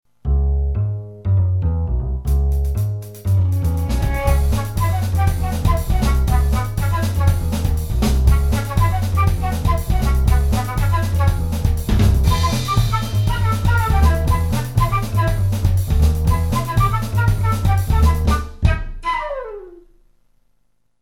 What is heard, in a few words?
flute